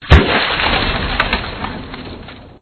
body splat

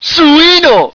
beam start

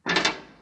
metal scratch

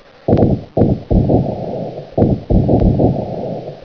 disaster; ambience

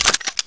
clip out; MP5

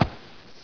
foot; hl2

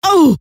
pain, scientist female

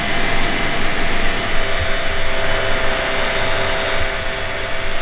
computer loop

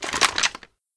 draw, MP5

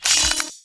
got battery

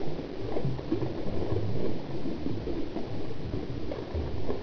lava

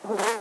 flies, ambience

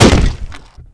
chest wood impact, debris

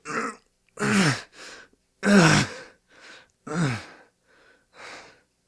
low health; police

